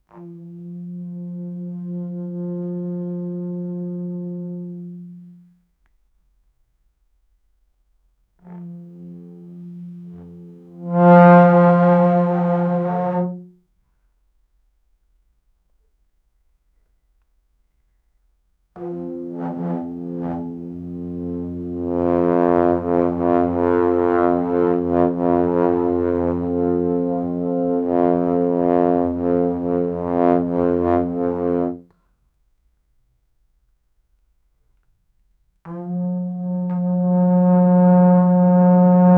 horn trombone tuba euphon; chase

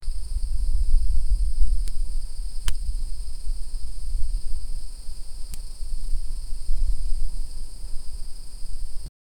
afternoon

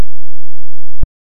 imp creature sound; character sounds

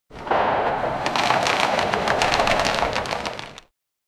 army encampment soldiers; summer

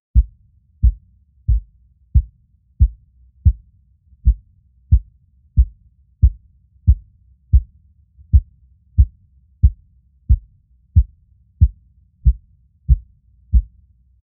heartbeat with reverb

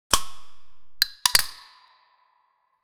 a sound of a beer

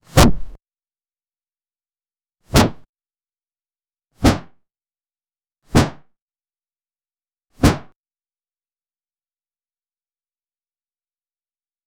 the intense muffled thud, blunt weapon

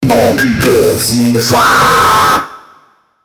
cheerful, happy

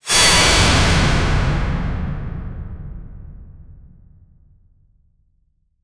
add a sharp sudden jump, stinger

